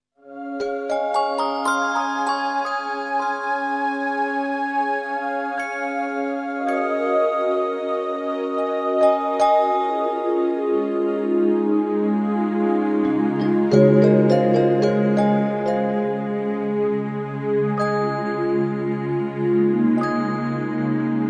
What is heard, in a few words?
Great compassionate mantra; Nilakantha Dharani